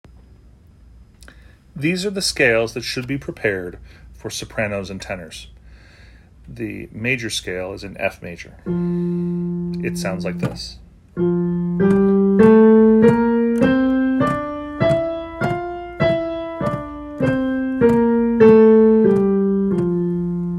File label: Scales Soprano Tenor Starting Pitches